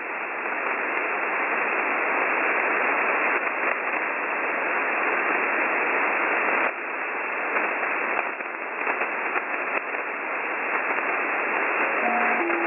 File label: Crackle